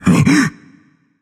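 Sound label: non-verbal breath; smite; atlas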